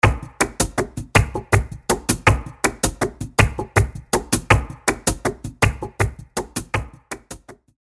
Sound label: Fandangos 161bpm, flamenco loops